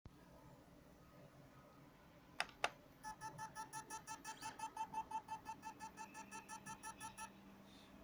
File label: HDD sound